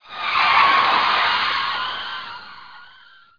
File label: SQUEAL